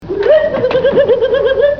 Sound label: woob woob woob; curly